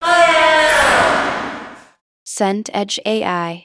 crowd gasp

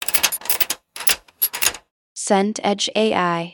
door handle turn

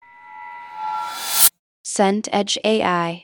reverse cymbal

